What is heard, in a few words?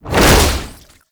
Underground Attack Swing; Warframe; Abilities